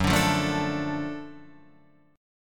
F sharp Minor Major 7th Sharp 5th, Guitar, Standard; strummed; 2,5,3,2,3,5